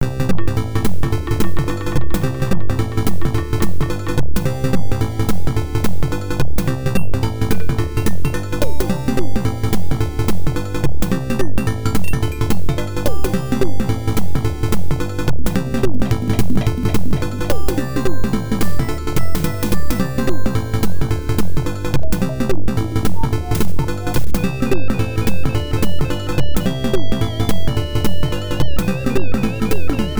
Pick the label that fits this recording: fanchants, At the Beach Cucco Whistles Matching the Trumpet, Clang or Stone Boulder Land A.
At the Beach Cucco Whistles Matching the Trumpet